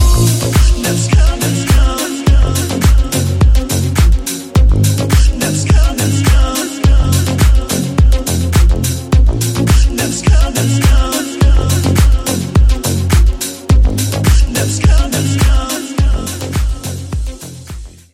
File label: nu disco remix